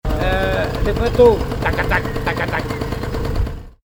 imitation Lesco cyclo

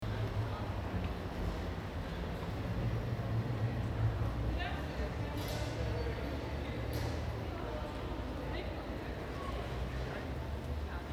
patio du centre; block one

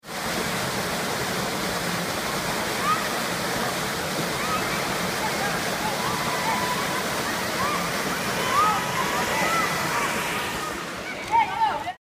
gafsa arrivee eau; resonances oasiennes

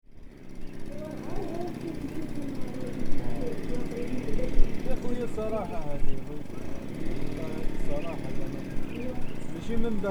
kenadsa petite place; resonances oasiennes

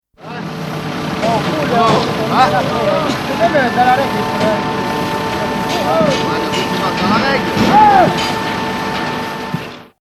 chantier repetition crescendo; Culture sonore

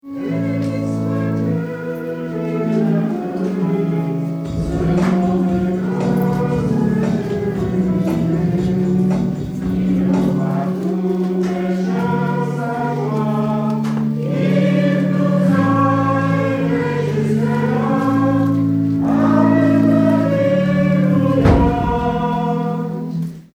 pardon voix frele; lesconil

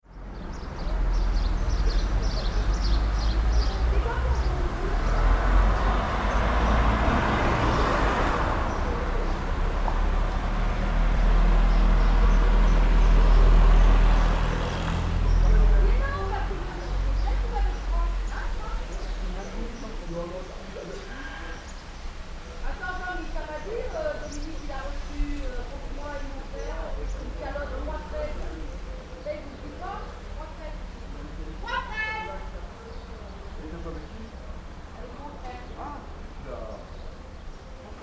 cloches aperitif; villefontaine; cartophonie

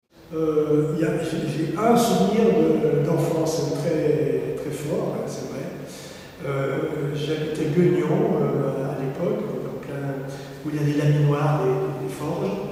FX phonomnese

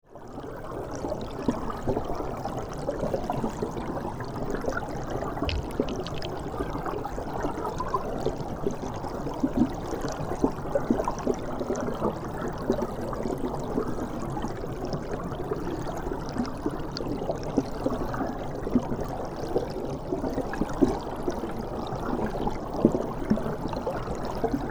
hydrophonie rapide

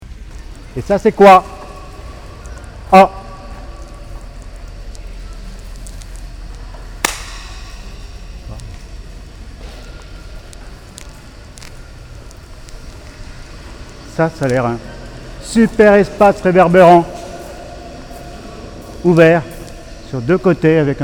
FX reverberation artificielle Venezia biennale

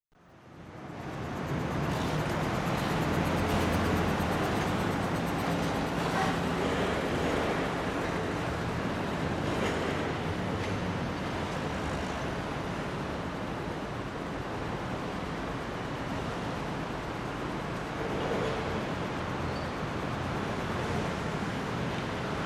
port barcelone texture grise

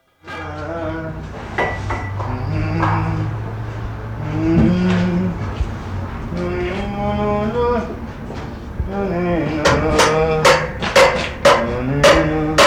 chant, chantier; Culture sonore

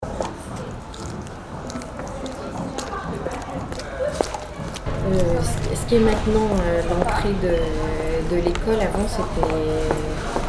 PARCOURS; winterschool

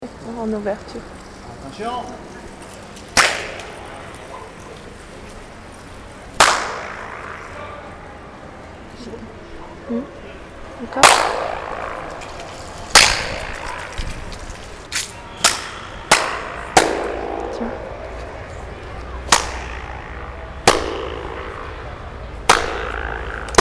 FX echo flottant Lisboa EXPO; siza

